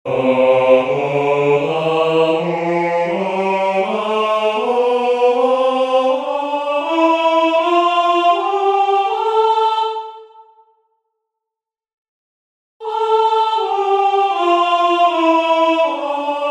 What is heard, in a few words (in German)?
Stimmlage Tenor